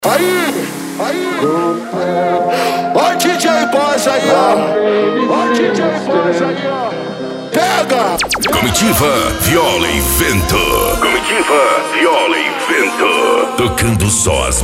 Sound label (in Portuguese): comitiva viola e vento